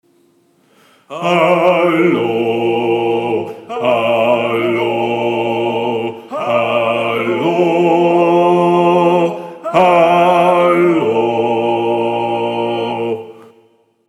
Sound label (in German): Hallo BASS, Gesang